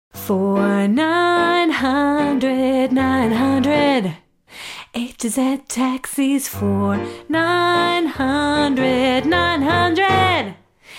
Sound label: taxis jingle